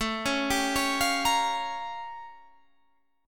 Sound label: A Augmented 7th Flat 9th A; A+7b9 arpeggiated; 1,3,sharp5,b7,b9